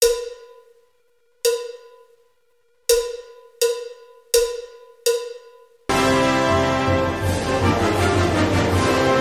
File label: Fanfare Tattoo